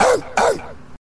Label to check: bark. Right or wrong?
Right